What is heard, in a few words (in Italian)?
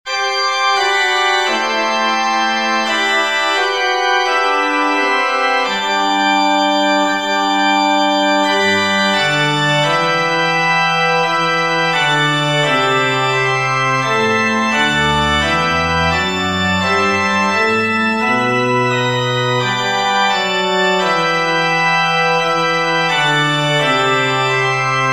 organo